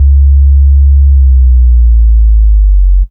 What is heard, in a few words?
Sub - 3B, Jungle; Bass; Blu Mar Ten; Jungle - 1989 to 1999